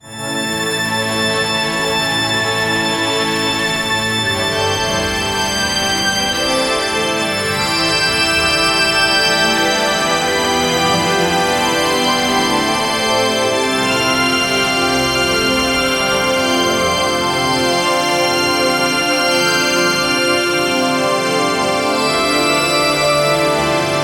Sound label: shimmering magical orches